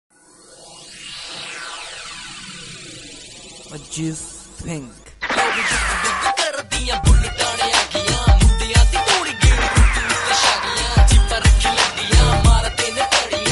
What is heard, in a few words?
Punjabi Rap; UK Punjabi